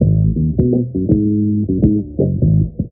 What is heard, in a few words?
bass badass pimpin, Phonky Loops